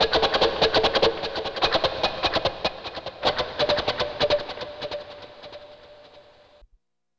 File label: GTR CHUCK, E.Guitar FX